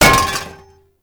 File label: Cannister Bounce; Enemies; Abilities; Warframe; Tau; Dax Archer